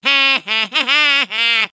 laughing; waluigi

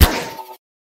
toolgun shoot; gmod

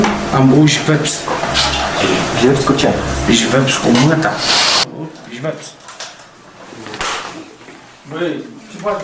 steps

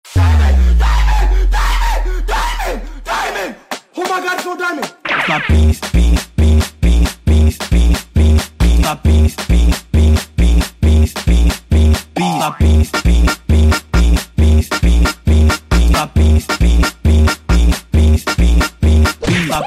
phonk

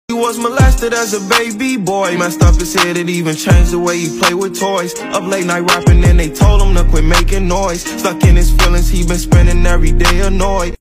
cheer

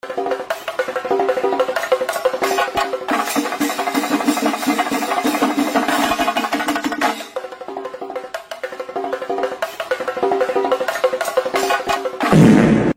chenda or drum

